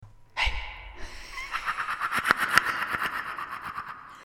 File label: ghostly Laugh; Halloween